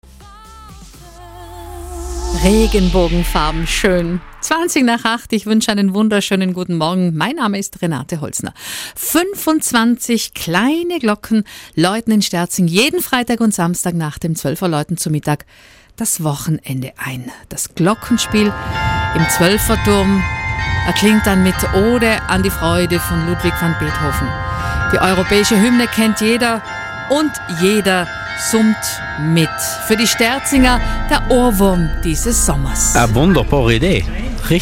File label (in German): Glockenspiel, das glockenspiel im sterzinger zwoelferturm